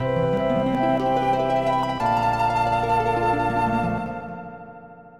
Jingle

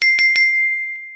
Spell

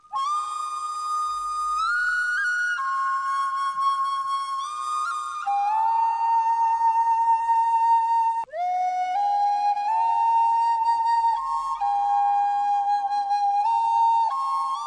Flute; Jab We Met

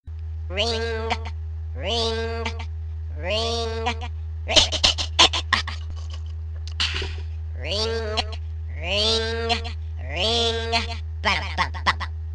Cartoon Ring

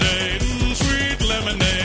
Sweet Lemonade Sms

